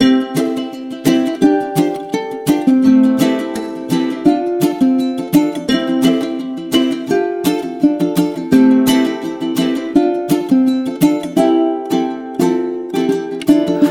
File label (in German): klingelton; tui cruises